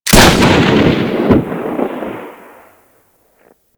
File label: Carrier Sent Shotgun Fire, weapons; DE; Warframe; Sentinel